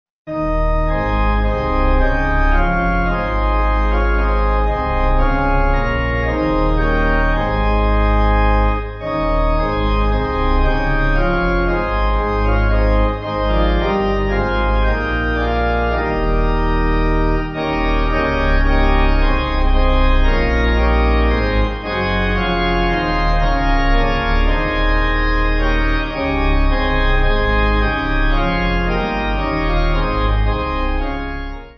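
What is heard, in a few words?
Pipe, Pipe Organ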